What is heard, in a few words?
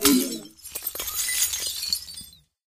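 editor connection destroyed; ui; Source Engine; Portal 2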